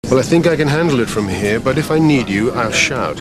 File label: shout